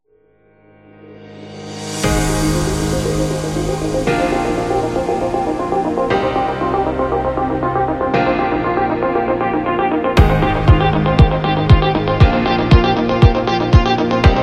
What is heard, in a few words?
A Dynamic; motivational speech